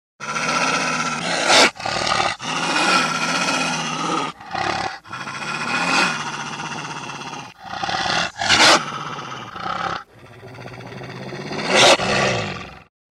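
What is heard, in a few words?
sound of wolf growling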